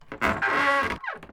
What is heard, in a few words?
wood door creak